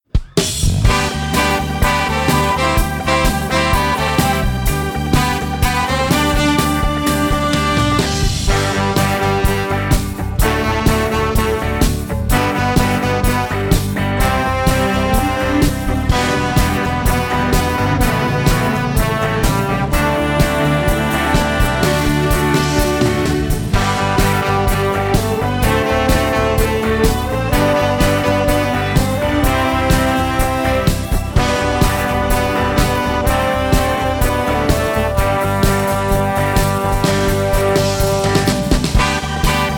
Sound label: flute